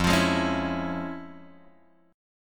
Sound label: F Minor Major 7th Sharp 5th; FmM7#5 Chord {1 X 3 1 2 0} strummed